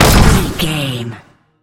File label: sci-fi weapon rifle large shot